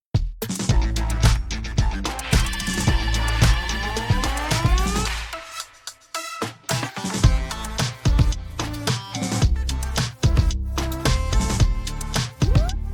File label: victory theme; Street Fighter 6